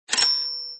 cash

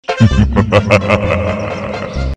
laugh

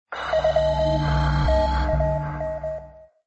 scan

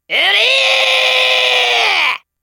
"wryyy"

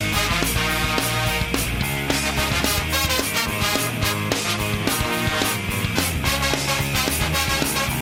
ska

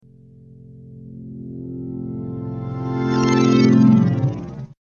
harp, reverse

